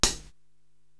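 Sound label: HH Big Chunk Close, hi hat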